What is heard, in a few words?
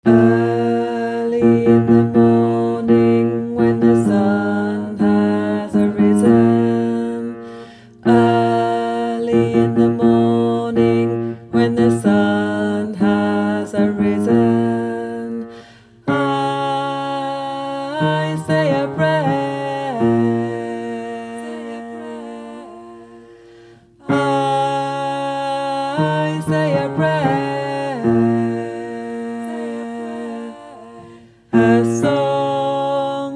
bass; The Freedom Choir